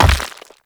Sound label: Brawler Rock Elemental Footstep Default; Warframe; Warframes